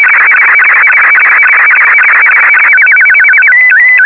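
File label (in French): SSTV Scottie 2, Slow Scan TeleVision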